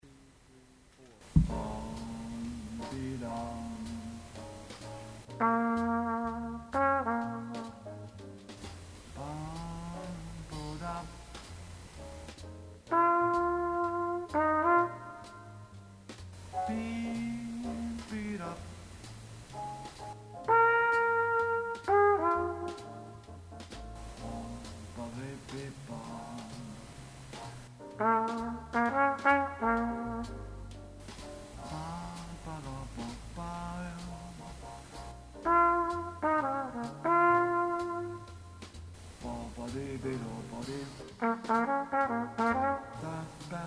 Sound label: Blues in Key of B flat concert exercice